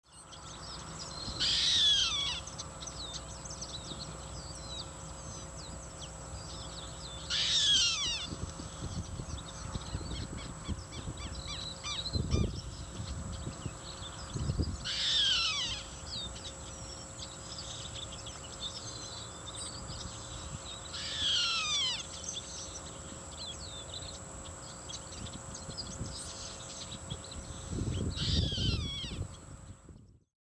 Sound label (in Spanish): chimango, avesdelchaco; cantos